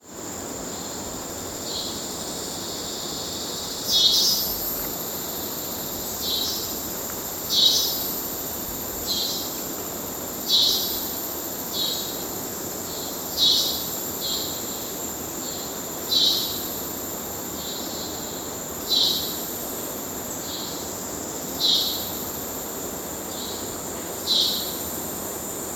nature amazon rainforest ambience cicada insects river in background; felix blume